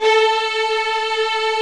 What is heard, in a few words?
STR SW VLN, Vlns 8 fff, Agitato; String Master Series